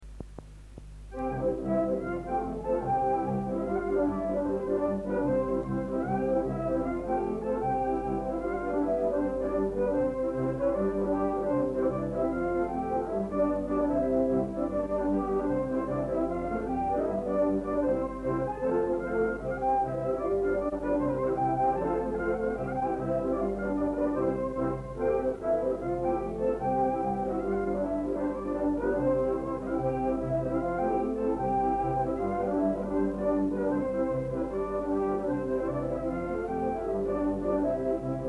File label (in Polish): Instrumentalny